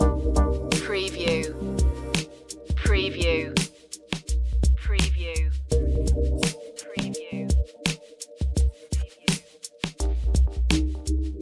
abstract vibes loop, chill

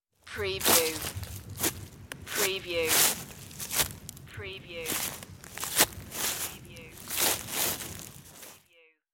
Leaf Walk

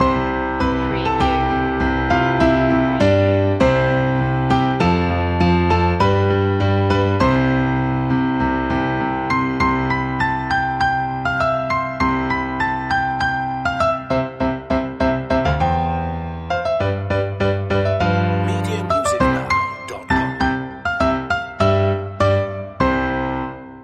joy to the world piano loop; media music now

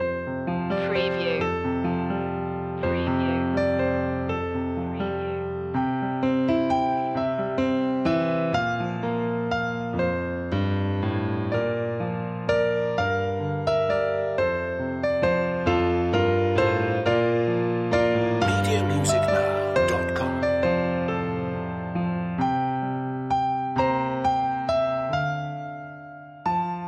silent night piano loop; media music now

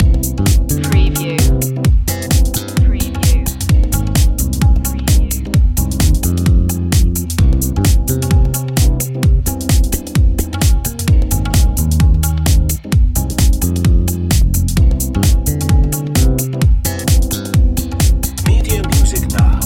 ethnic night life loop

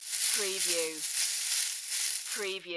Grass Movement Foliage

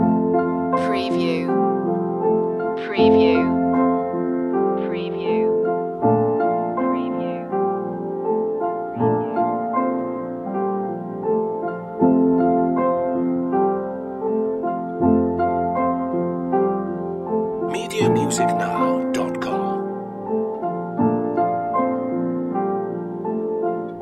slow summer loop

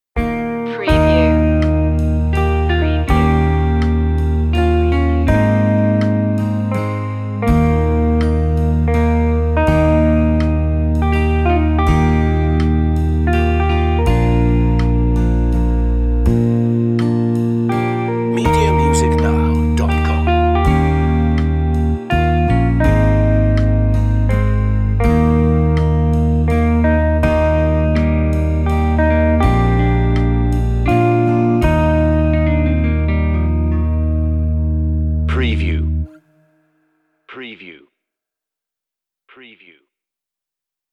amazing grace brisk guitar verse 82bpm

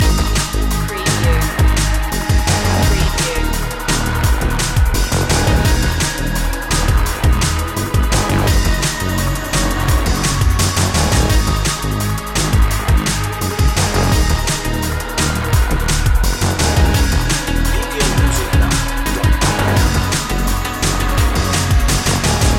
lost in space loop

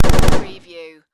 Pulse Rifle Short

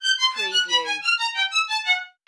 Music Strings Descend

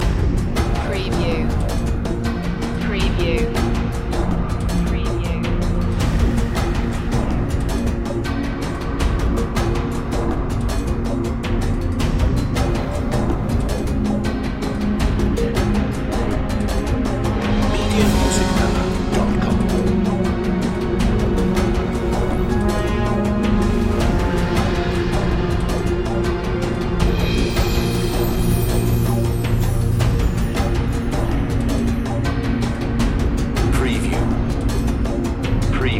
miracle voices loop

